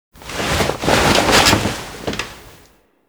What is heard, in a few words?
Hub Apartment Foley; Warframe; Zariman; Cinematics